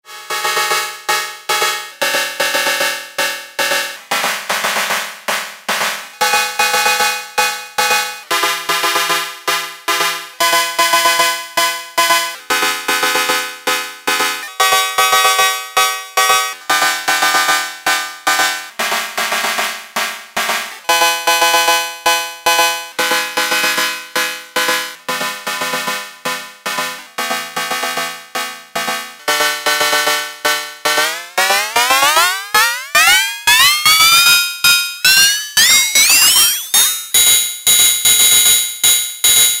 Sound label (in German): Himalia Multi OSC plus Hochpass; Io Instruments